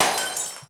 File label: MPC4 GLASS; Crypton - DrumBase5000; ELEC.DRUMS